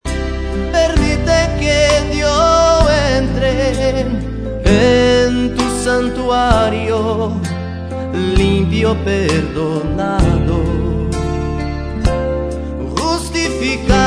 espanhol

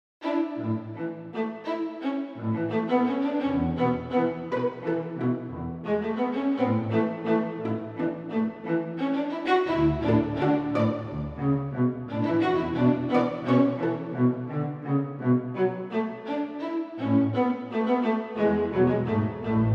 orchestra

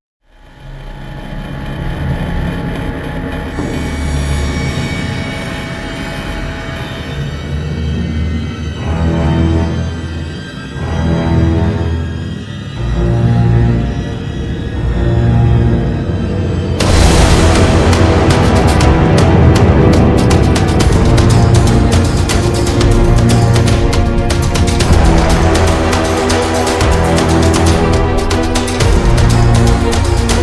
horror music dark music musica de miedo dark; killer

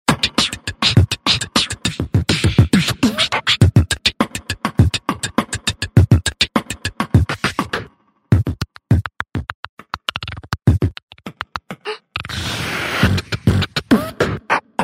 beatbox

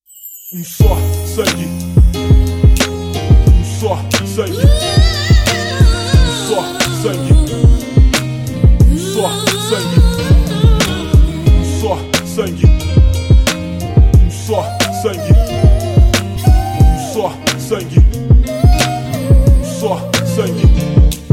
beat

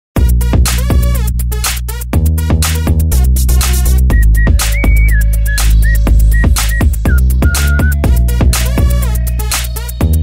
hip hop 4x speed; roblox music

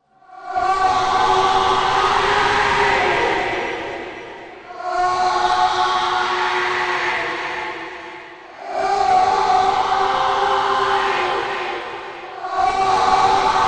the most terrifying screaming sounds

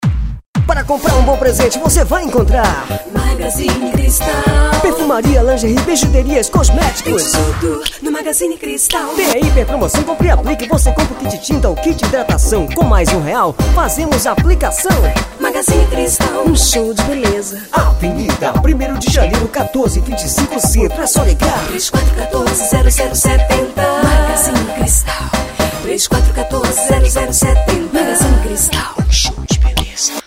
jingle; magazine cristal; jingle institucional

